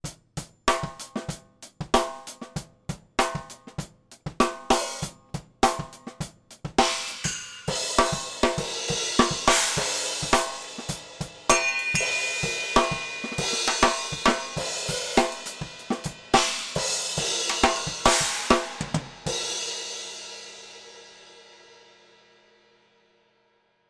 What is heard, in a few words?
drumset groove overheads solo; img stageline